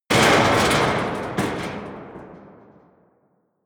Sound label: heavy plate, hits impacts; whooshes rises